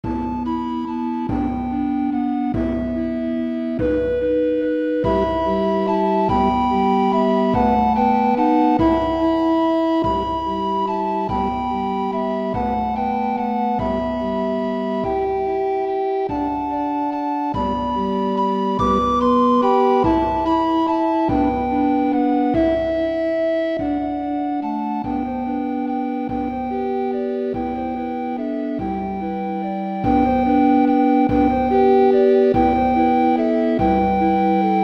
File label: Recorder Track with Melody; We 3 Kings